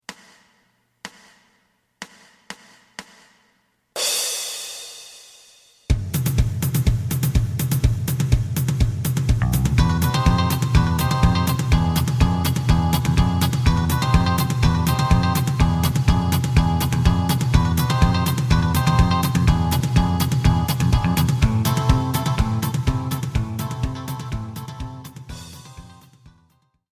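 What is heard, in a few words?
lead guitar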